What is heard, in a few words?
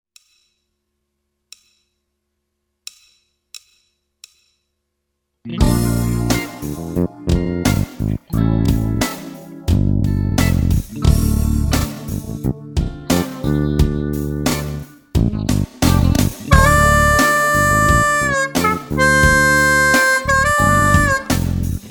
Blues